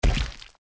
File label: limb explode, gore; Soldier Of Fortune II Double Helix; XBOX